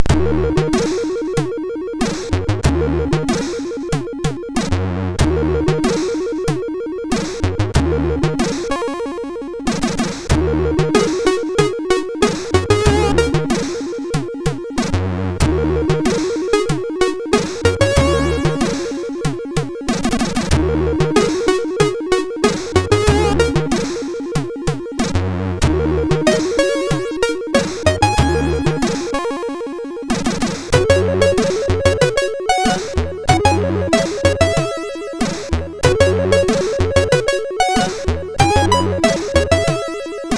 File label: Slow Funk, sid MOS6581R2